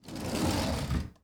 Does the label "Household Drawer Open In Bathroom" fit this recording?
Yes